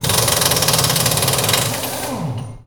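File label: Impact big, air tools, industrial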